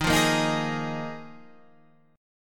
D sharp Suspended 2nd Suspended 4th, Guitar, Standard; strummed; 11,8,8,8,9,11; capo=2